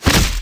Sword Hit Enemy

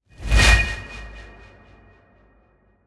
Frozen Blight; Enchant

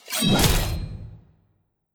Orc Cleaver Attack

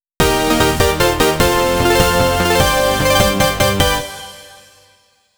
Jingle - Route Clear; Sphere Knight

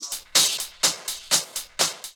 Chorus Hihat 4 beats

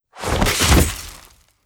Body Fall Knight Wearing Coat Of Arms Dirt

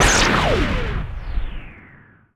Relic Suit Fire

